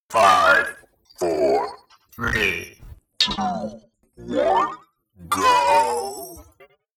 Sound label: countdown